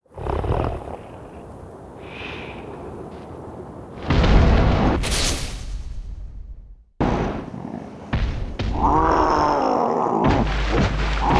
Appear

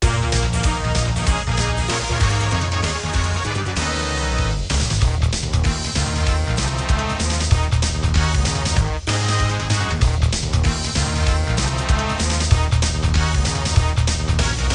Turbo Multiball, pinball; Indianapolis 500; bally pinball

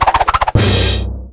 Character Attack

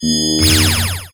Character Attack; MAD

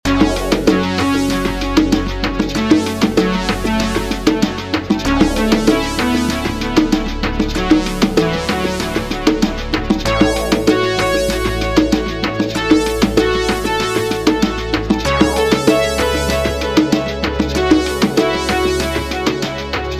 Menu; Pako Rumble; Android gamerip 2021

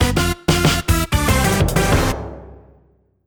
Miss; Bowser Miss

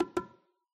Sfx Quest Bongos

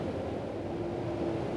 Wind Speed slow; Puddle; puddle plus ios gamerip 2018

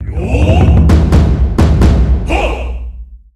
Monster Level Up; Shiren the Wanderer: The Tower of Fortune and the Dice of Fate; PS Vita

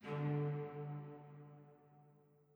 chime old; chime sharp

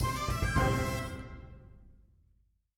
Music End 108bpm; Act Brittany

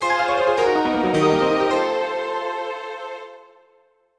Harp; Brandish 4; SC-88Pro; Canticle

